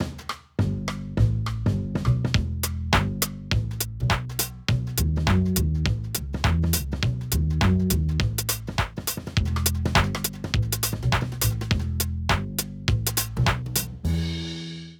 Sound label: Music; Verses And Flow; Personality